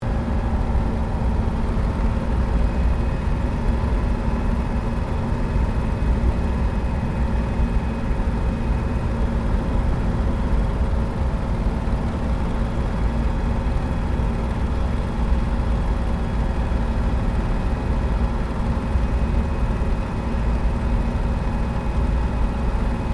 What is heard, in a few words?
Aircraft P-40 Kittyhawk land Interior, WW2 Kittyhawk or Warhawk fighter plane; 1940s; Airplanes